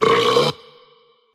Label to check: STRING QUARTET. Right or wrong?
Wrong